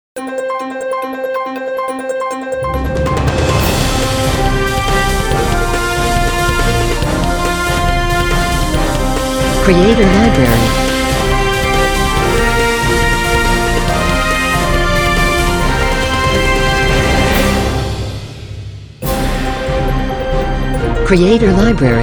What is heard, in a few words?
Intro News, corporate music